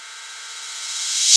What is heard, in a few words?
808 cym